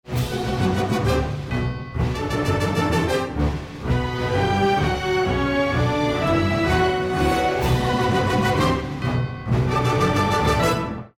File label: bomb ten sec count; music kits custom